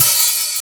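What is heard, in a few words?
open hat; Funky Ass Loops; East West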